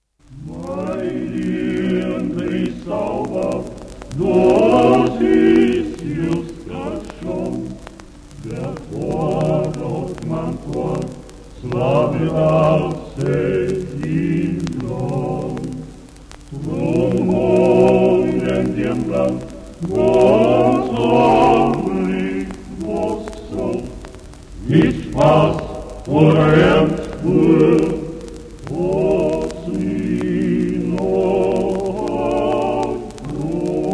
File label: quartette